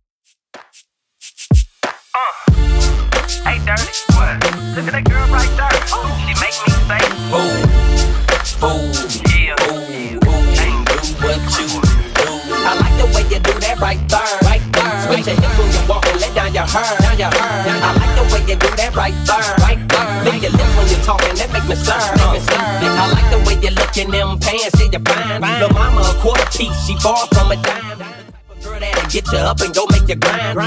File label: Throwback Rnb DJ Friendly 93bpm